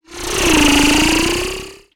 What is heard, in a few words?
Oscillating Sci-fi Transition